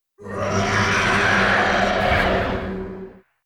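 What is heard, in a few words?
ice guardian death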